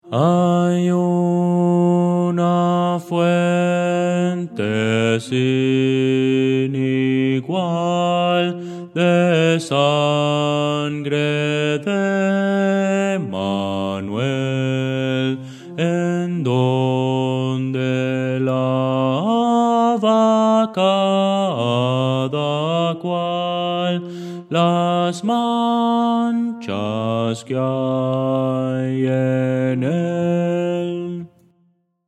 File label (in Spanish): bajo; hay una fuente sin igual; Voces para coro